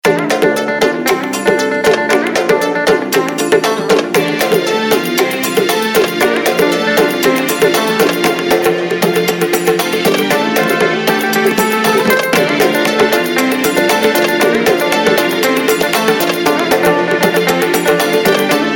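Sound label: carnatic, micromax phone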